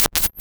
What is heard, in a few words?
Morse Coder Scratch; Cwejman S1; DevSnd Cwejman S1 - JK